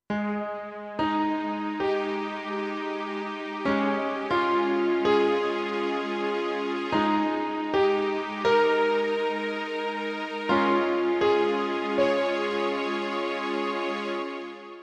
Piano Strings, Pianoforte digitale Yamaha Clavinova CLP-270; 03. Piano Strings; I SUONI - GLI STRUMENTI XG